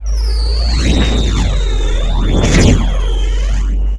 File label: explo slicing missile; Earth and Beyond; EA Games